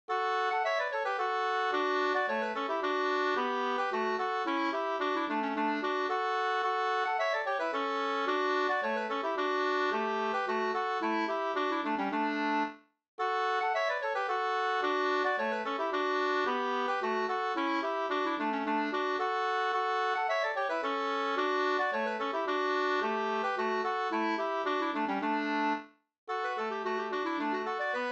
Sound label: polska; Jubileumspolskan